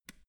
switch small turn off soft

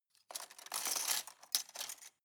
cutlery plastic multiple drawer place soft

